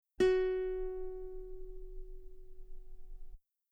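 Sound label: guitar F sharp 4 very long forte normal; bananenkeyboard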